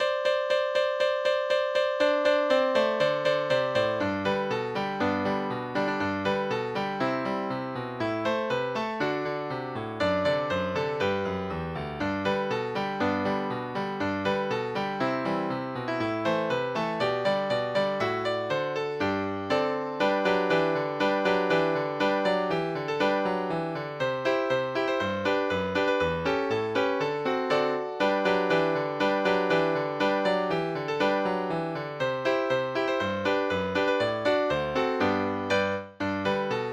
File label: jingle bells; religiosas